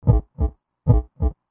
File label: heartbeat